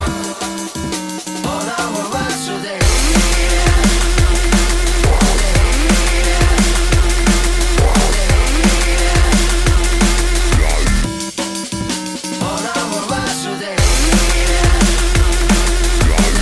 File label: Dubstep